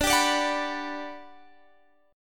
G Major 7th D

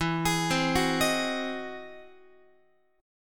E Augmented Major 9th, Guitar; arpeggiated; E+M9; JGuitar

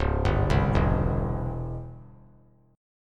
C sharp Minor Major 7th Flat 5th; C#mM7b5 arpeggiated; 1,2,3,2; JGuitar

